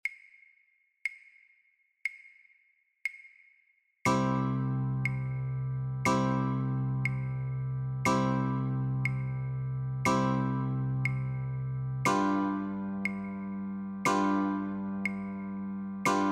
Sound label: Chords and Claves, guitar